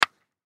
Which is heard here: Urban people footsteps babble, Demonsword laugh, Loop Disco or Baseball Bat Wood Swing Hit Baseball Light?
Baseball Bat Wood Swing Hit Baseball Light